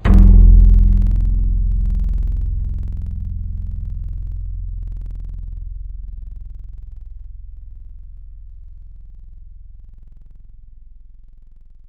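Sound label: world Lute, Wavetables; Synthetic's Serum Collection; Standalone-Music SYMPHONY Wavetables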